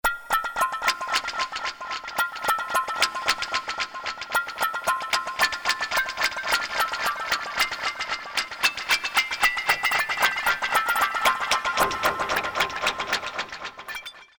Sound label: FX Echo